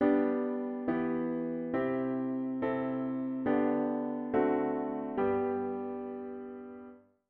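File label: diatonic inverted V7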